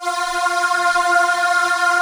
AIR VOX F3; AKAI S-Series CD-ROM Sound Library VOL-7

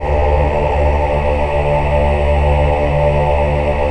VIB D2, VIBRATO, Voices; Spectrasonics

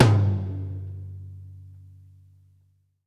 RACK PROCES, toms; Sampleheads; New York City Drumworks VOL-1; SP TOMS

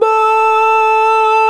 VOX, Male Scats

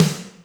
Snr Ambient, Alesis D4 drum machine

